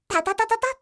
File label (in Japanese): tatatatata; dousa